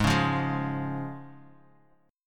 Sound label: Db Major Ab